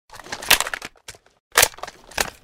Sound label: ak47 reload tactical; EMXArms; draftsman